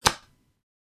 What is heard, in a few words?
Deck of playing cards shuffle